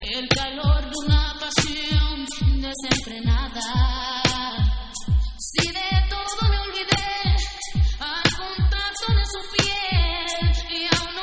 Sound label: Reggae Mix